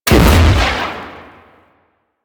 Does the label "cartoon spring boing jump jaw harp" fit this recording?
No